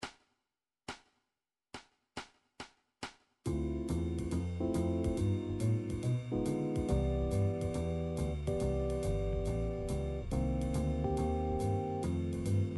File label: jazz slow, midi; song book